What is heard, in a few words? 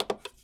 Button - Push, keyboard